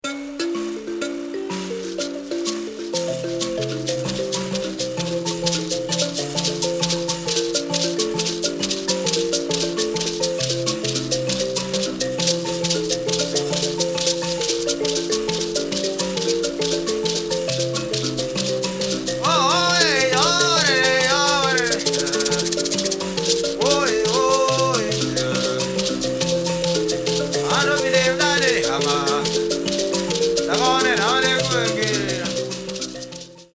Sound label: mbira